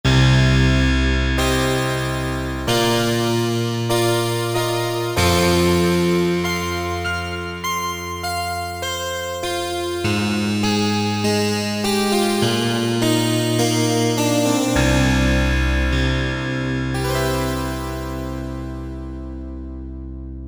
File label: Velo Sweep; Korg MSC-1S Variety